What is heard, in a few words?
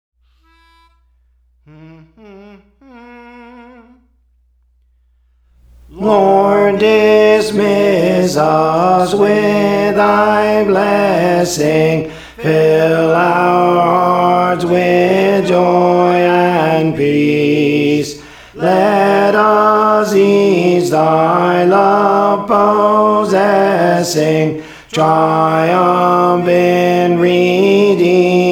Alto; Praise For The Lord Training; Lord Dismiss Us (SICILIAN MARINERS)